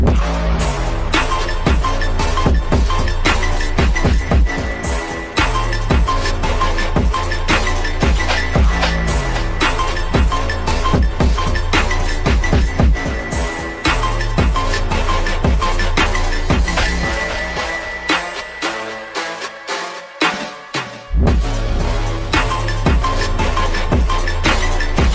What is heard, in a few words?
Reno Z Remix Ringtone